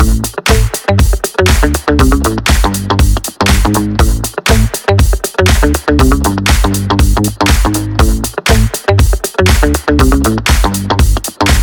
Funk Loop

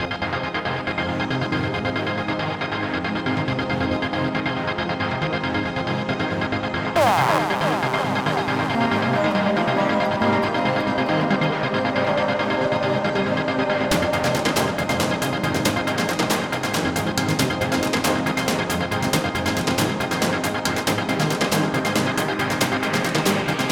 Hype Trance; Social Animal

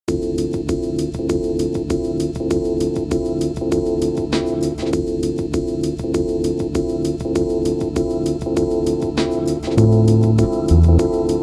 Trumpet Stomper Mix

